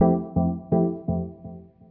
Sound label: E PIANO 125, Keys; Houseworx